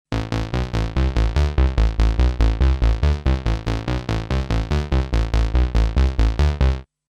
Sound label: Artiphon ORBA 2 Bass